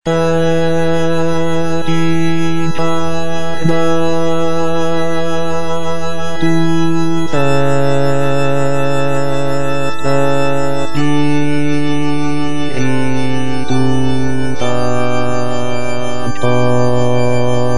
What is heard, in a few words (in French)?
basse; Vivaldi credo; et incarnatus est